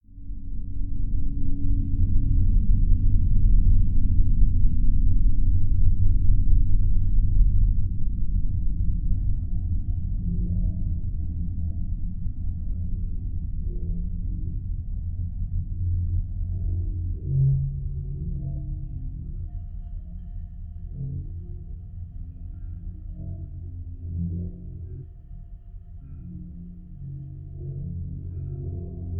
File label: Spooky; AudioWizards